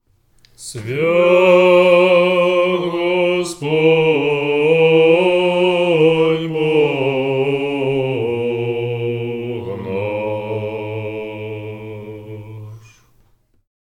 holy is the lord our god tone 1

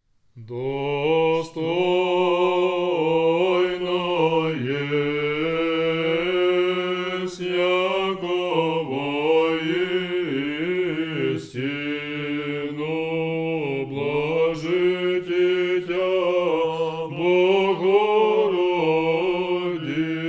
it is truly meet tone 3

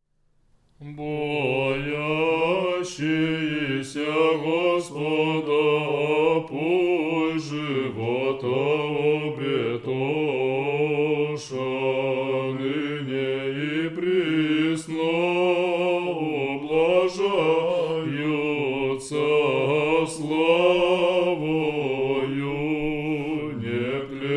third antiphon tone 3

